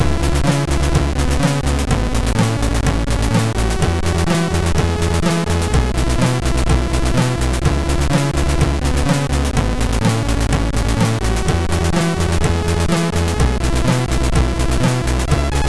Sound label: Peace, MOS6581R3; hvsc